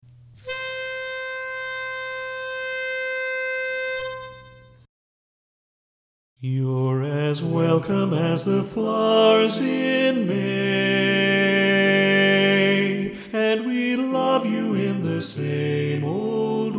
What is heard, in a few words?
Flowers in May Lead, chorus